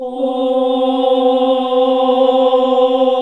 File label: OHH C2; Best Service Gigapack 1